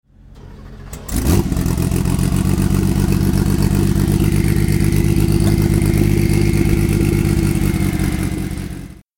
Porsche 935

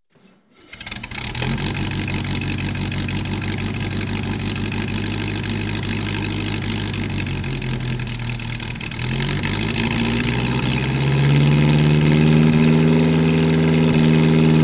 bug rally 8 cyl

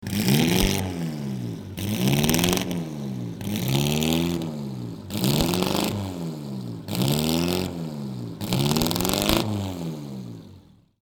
Bugatti T35B

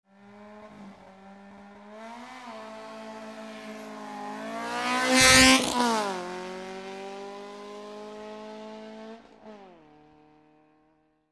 cooper

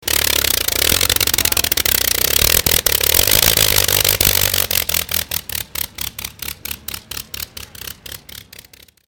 Stanton Spl

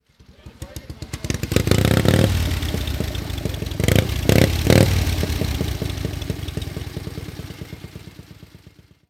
Harley Davidson

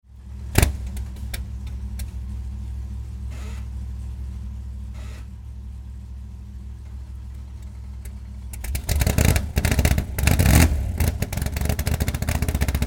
Lister Corvette; 1959

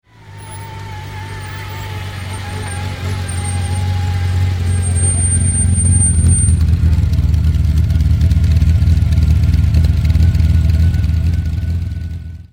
Ferrari idle; nart

